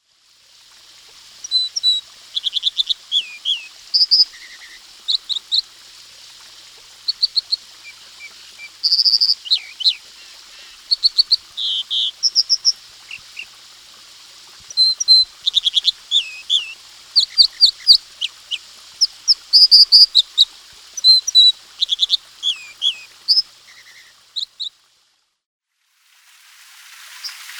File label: American Dipper